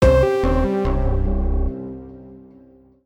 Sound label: MUSIC EFFECT Platform Negative; stereo; Universal Sound FX; MUSIC EFFECTS